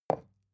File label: glass put down heavy fast; objekte